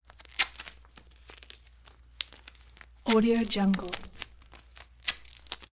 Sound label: duct tape tears sticky finger releases handling